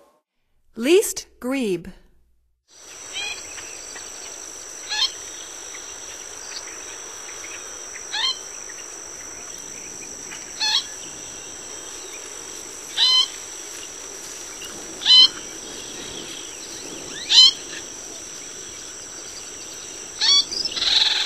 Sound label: Least Grebe; North America; Stokes